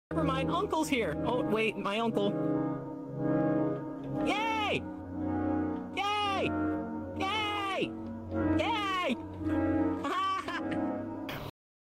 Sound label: yay